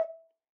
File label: Ocarina Sus Vib E4 rel, Ocarina, Typical, Releases; Aerophones; Edge-blown Aerophones